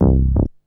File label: BASS; SoundFactory; SMPMANIA